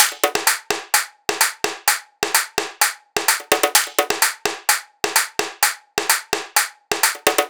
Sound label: Perc Loop, 128 BPM; Cymatics; EDM Starter Pack